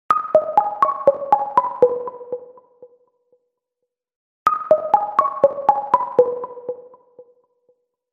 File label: alert; binance